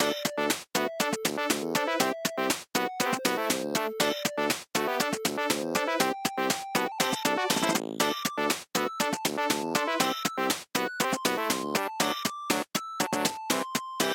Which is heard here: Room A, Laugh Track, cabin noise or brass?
brass